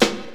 classic natural hip hop acoustic snare a sharp key; Duration 0:01; Channels Mono